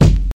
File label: thick bass drum single hit F sharp key, kick drum sound tuned to the F# note